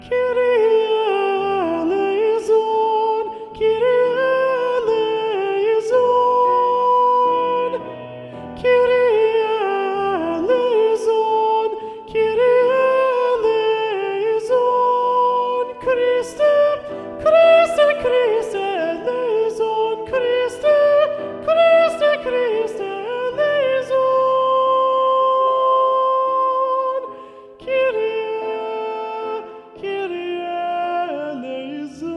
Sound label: soprano